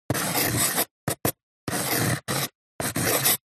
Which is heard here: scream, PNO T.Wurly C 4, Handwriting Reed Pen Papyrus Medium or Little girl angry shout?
Handwriting Reed Pen Papyrus Medium